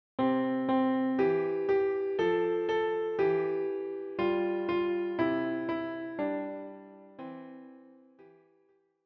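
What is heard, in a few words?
piano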